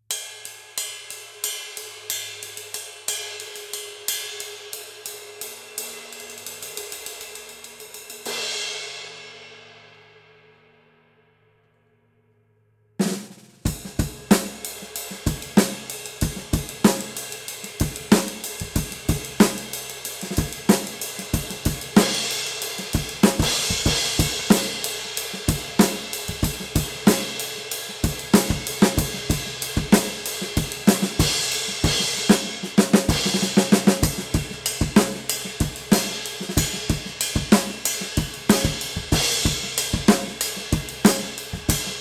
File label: Vintage Pure Light Ride